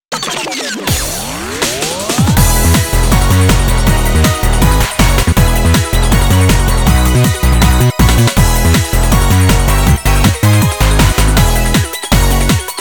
Off Vocal

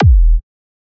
Kick

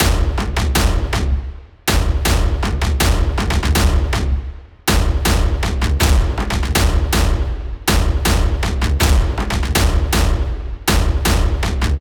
Drum

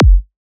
Kick

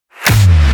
Big Snare

